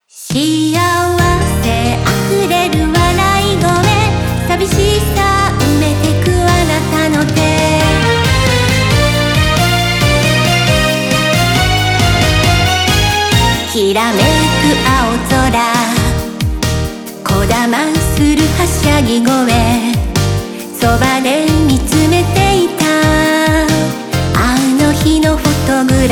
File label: BGM Reach